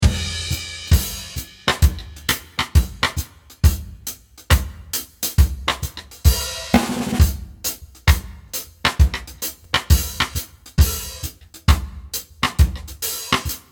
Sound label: Reggae 67